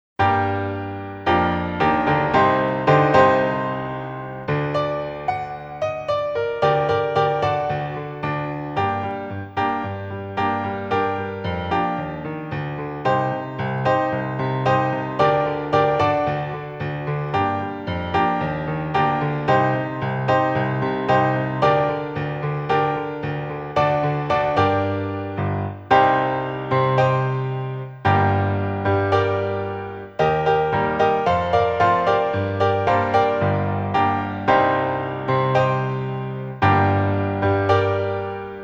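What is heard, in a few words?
Ride the Gospel Train accompaniment; satb; partbypart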